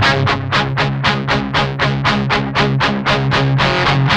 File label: 8TH D 3, Guitars; Spectrasonics; Hans Zimmer Guitars VOL-1; 128-JACKHAMR